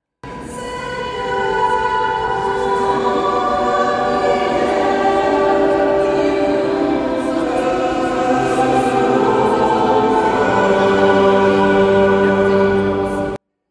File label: Tutti; Seigneur entends la priere